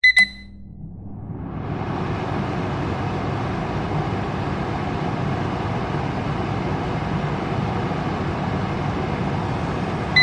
household air fryer on run off short; zapsplat

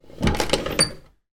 household window lock old fashioned

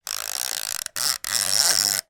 timer plug wind slow

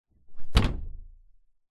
household door open loft hatch jump

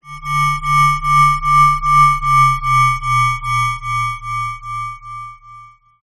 sound design frequency modulation whining hum

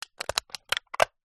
soda can empty cut open movements pressure; zapsplat; foley

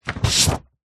paper sheet a4 pull slide out from under another; foley

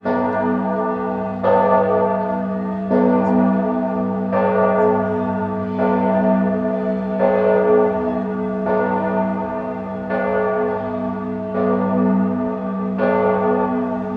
Church Bells; Blastwave FX

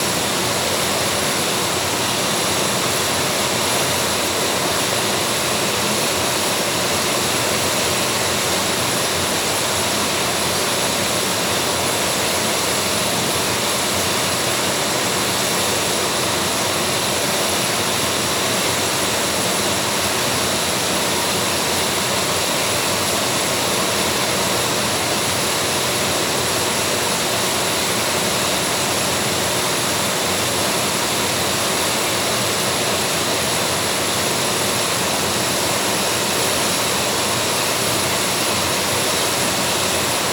Jet A10 Engine; Blastwave FX

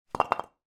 foley breeze block drop on hard ground

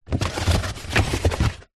foley cardboard box open

